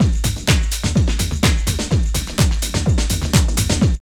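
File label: drum loop; X-Static Goldmine 3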